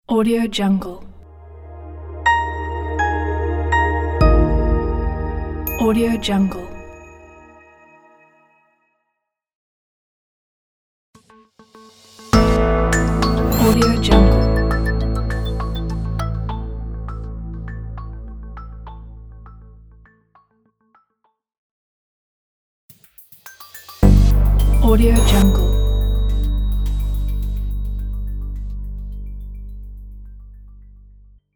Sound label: inspirational clean logo pack